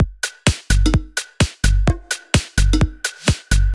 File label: tech house closed hat loop; drum loops